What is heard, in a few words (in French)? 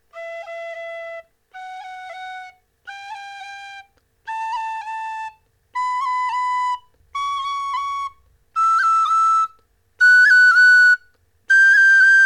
rolls